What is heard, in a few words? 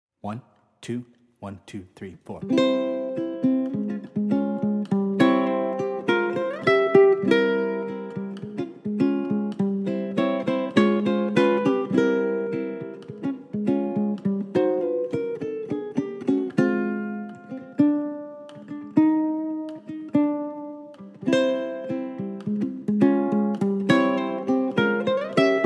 uke